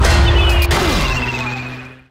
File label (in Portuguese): impacto resistido